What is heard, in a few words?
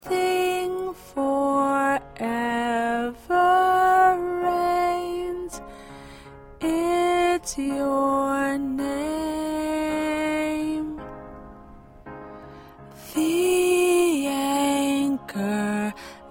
Lead